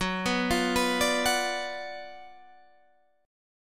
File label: F sharp Major 7th Suspended 4th Sharp 5th, Guitar, Half Step; 15,15,16,17,16,15; Half Step Down